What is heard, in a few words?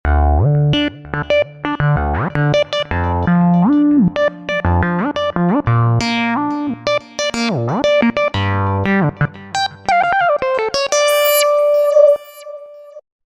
Clicky Lead, Roland V-Synth; Factory Patches